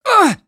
Damage Male; gamerip; Car Simulator 2; android

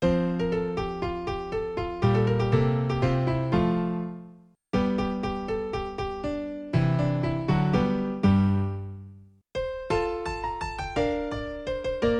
Christmas songs

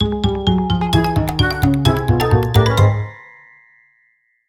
Game Draw; chick chick boom chicken battle; wiiware

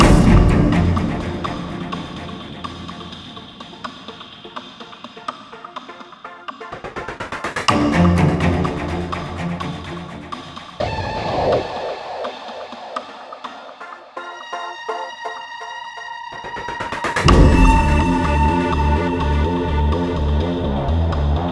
Manhunt Ambient; modern combat 4 zero hour; android

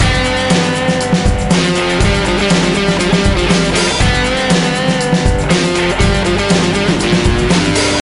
Blues Rock; gamerip; Happy Feet Two; nds

